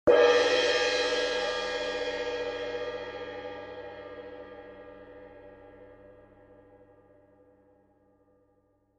18in Classic Orchestral Selection Suspended, cymbal sound